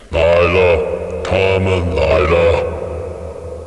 zombi coming; left4dead2

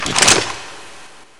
supply pickup; left4dead2

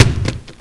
zombie bomb bounce; left4dead2

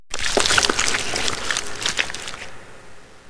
zombie female head up; left4dead2

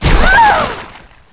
zombie trapped female; left4dead2

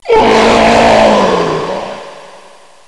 zombie death; left4dead2

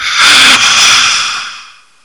zombi heal female; left4dead2

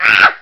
zombie hurt female; left4dead2

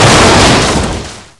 zombie metal broken; left4dead2

